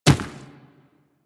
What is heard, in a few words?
Inf Medium Run Dirt, Infested Footsteps; Warframe